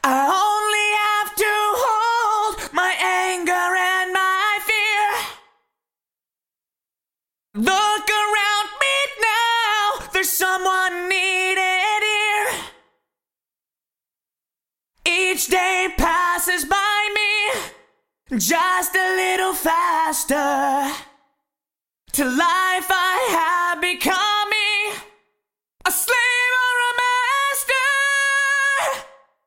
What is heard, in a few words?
Vocal Eventide H8000 Masterverb Room; Vintage Digital